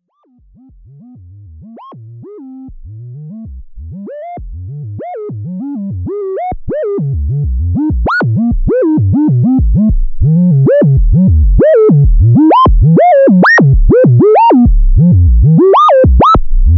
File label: Sample Sine With Portamento, analog synth; sample and hold; Paolo